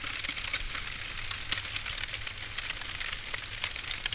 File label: crackle, ambience